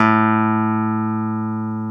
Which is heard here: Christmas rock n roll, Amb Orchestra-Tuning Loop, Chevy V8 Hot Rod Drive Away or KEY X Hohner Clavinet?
KEY X Hohner Clavinet